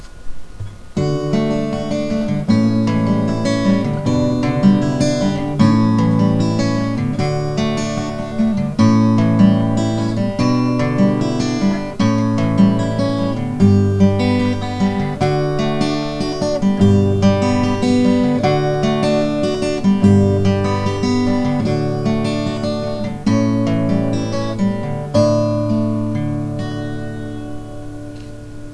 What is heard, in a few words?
dag picking